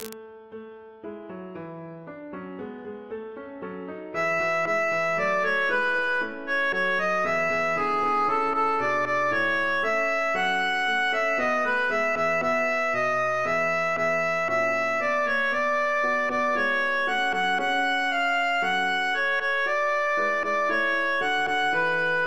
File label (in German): sopran